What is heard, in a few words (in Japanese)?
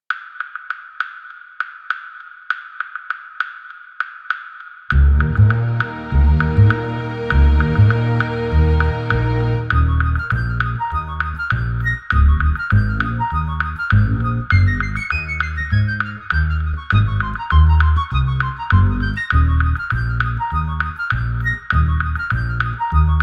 yukiyamano mura, bgm